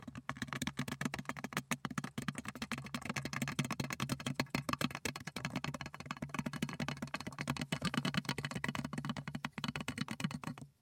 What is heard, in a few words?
mice loft noise